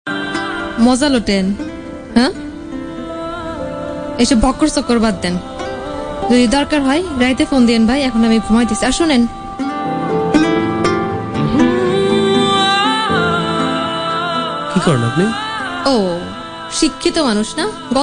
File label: funny ton